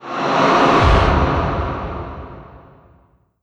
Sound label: movie whoosh impact